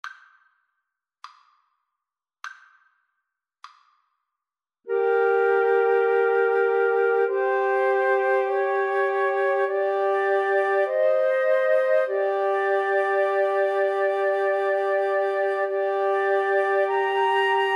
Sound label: Flute Trio